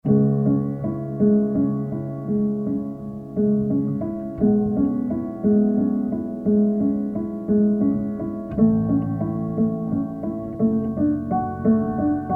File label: Resonators Piano Badminton Racket In Piano Case Stereo